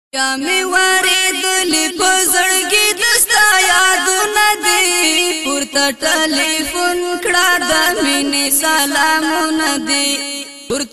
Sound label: pashto rington